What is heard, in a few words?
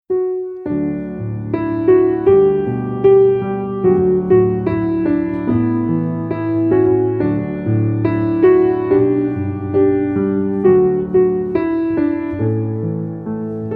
piano